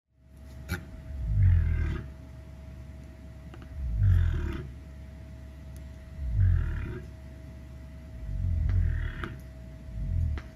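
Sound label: Cassowary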